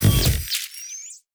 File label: gold spear